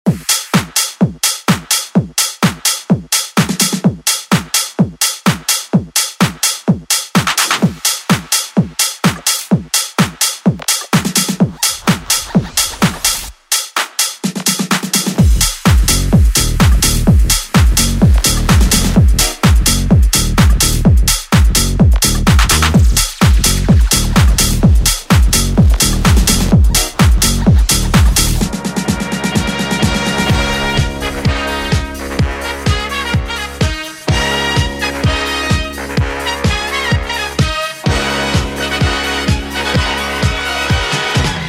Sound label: tech house; Deejay Hit